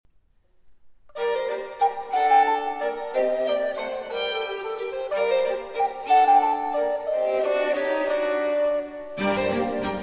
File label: Sonata For Recorder, 2 Violins & Continuo in G Minor, Spiritoso